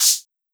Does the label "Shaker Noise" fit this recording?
Yes